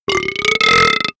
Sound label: monster burp; comedy-cartoon; soundpool